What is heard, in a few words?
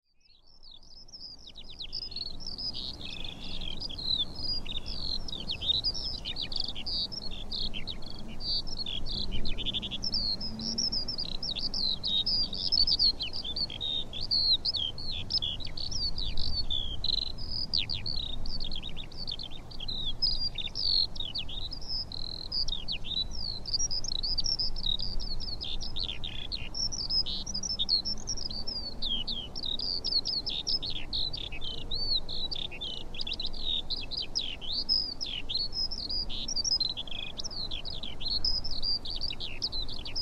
skylark, Dartford marshes